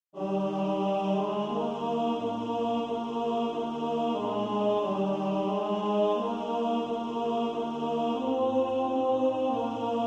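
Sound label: tenor; Praise the Lord ye heavens adore him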